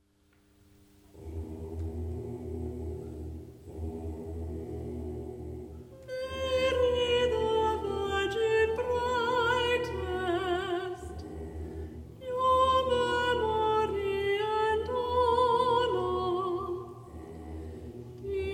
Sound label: Hymn To Mary Choir; Brisbane Concert Choir